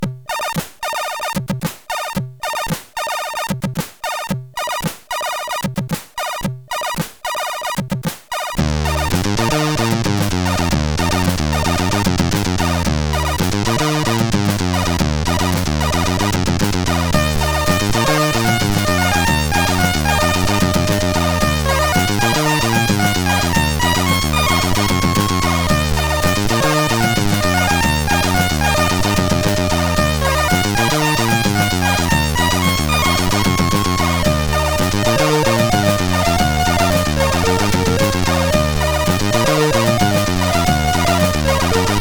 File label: Wind Surfer, CSG8580R5